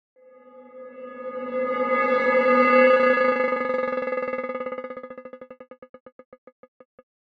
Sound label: granulation, Granulation of the source sound to achieve a percussive sound; Spectralism and Microsound